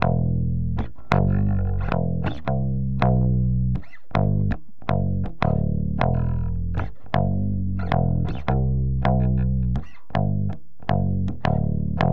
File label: basse